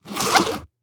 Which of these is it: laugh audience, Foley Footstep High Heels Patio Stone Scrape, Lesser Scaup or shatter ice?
Foley Footstep High Heels Patio Stone Scrape